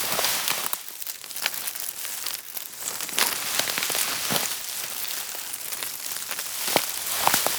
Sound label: Foliage Movement Grass Run; Warframe; Tenno; Foley